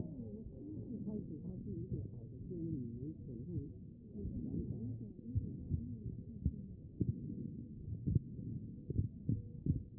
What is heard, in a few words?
chest; B MODE